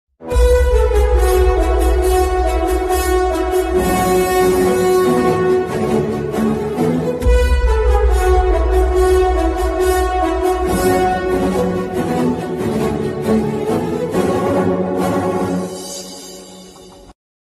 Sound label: title bgm ringtone; varisu